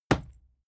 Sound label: fight impact sweetener cloth; nahkampf; soundpool